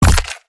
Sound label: Teleporter Melee Impact Base; Evolve; Wraith